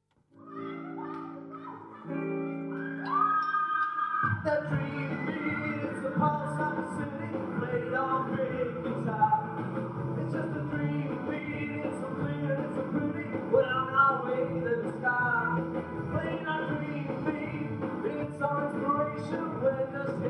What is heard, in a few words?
dream beat live